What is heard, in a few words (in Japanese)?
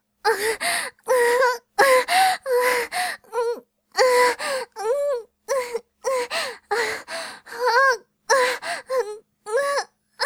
普通に喘ぐ, ボーイッシュ系 音声素材; Honey Drop